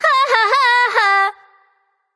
tongue; smite; terra

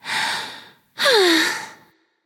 sigh; Smite; athena

